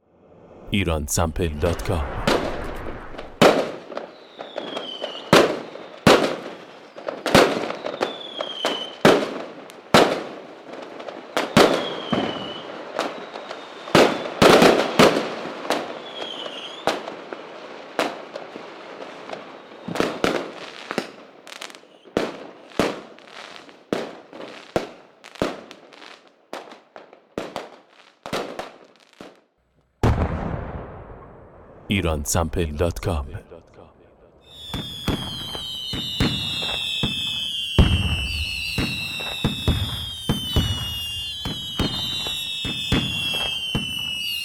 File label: firework; Iransample